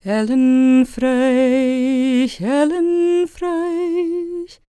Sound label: GAELIC, World Voices, GAELIC FEM; ILIO; Vocal Planet